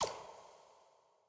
ui generic click

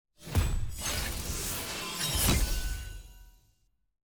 celebrate ranked promote to challenger; leagues

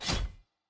missions metal in

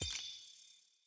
ui left button click

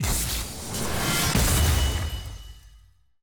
loot open worlds large orb outro

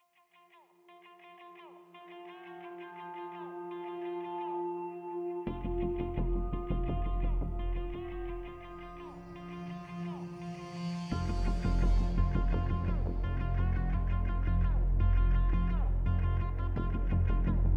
music in queue loop; urf

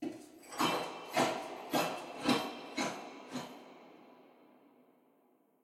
dance

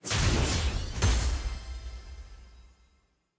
honor receive

